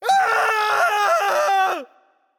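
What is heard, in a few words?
nonverbal fear; voicepack; smite; merlin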